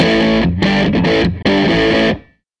Les Guitar Loop

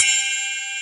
Bell, E-MU PX-7 drum machine

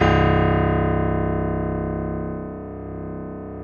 piano C 0; AKAI S6000 CD-ROM - Volume 1; STEREO G-PF 2

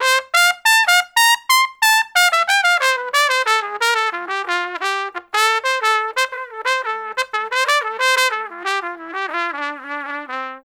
Trumpet Solo, Jazz Traditional

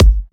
Dubstep Kicks

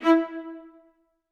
E3, Orchestra; AKAI Advance Orchestra Upgrade 97 Vol.1

